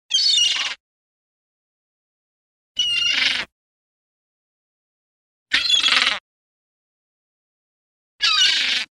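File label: clear cries of one seagull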